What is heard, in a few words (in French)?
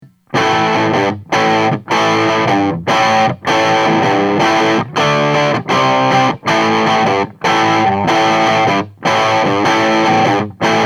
Canal 2 puis 3 à fond, SH4 bridge; White Jack, un 3 canaux; AMPLI